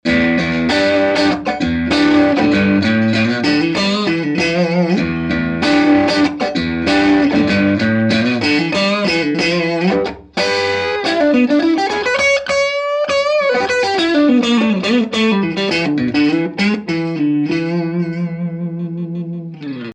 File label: crunch neck middle, Strat; schwarzbach; VP-Strat-56